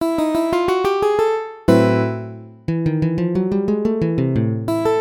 outro, gipsy jazz

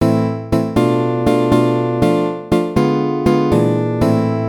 turn around example; gipsy jazz; ターンアラウンド

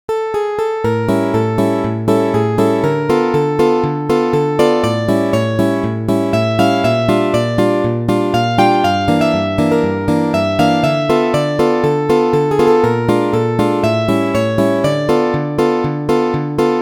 dark eyes main melody

